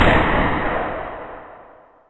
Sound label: cannon